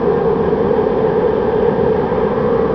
Speed Wind

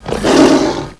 Hit

